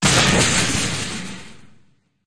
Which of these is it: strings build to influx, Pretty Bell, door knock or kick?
kick